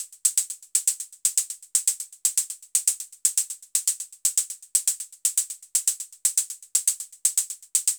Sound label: Anabeat Hat